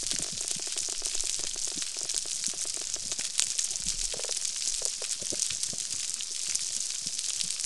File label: Unknown sp Percussive Sound; Pickles